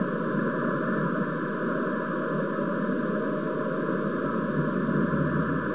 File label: western wind left, ambience